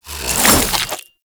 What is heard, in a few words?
Boomerang Whoosh; Infested